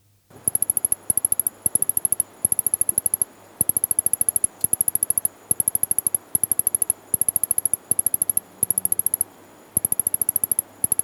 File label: Pelophryne, Mulu Api